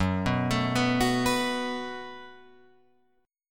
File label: Gb Minor Double Flat 5th, Guitar, Open F; Gbmbb5 arpeggiated; 6,6,9,6,9,6